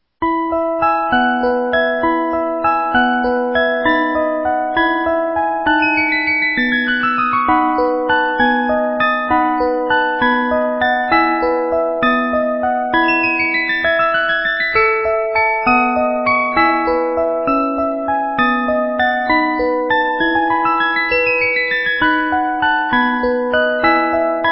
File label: Merry Widow Waltz, 30 Note